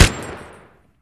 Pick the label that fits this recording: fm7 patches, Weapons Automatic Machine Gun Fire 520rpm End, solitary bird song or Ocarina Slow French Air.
Weapons Automatic Machine Gun Fire 520rpm End